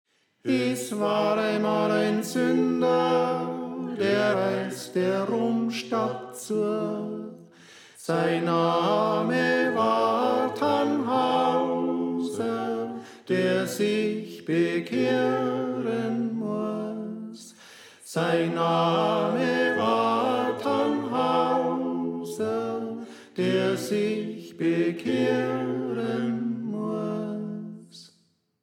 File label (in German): Tenor; Es War Einmal Ein Sünder